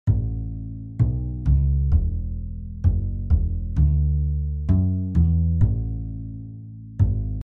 130bpm bass

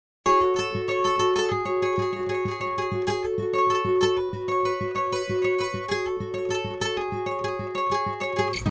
happiness gitref

